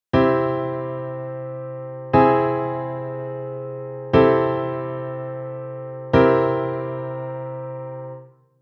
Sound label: cliche c up piano